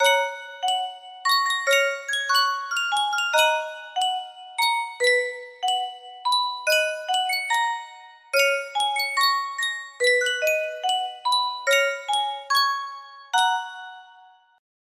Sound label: Sankyo music box, music box melody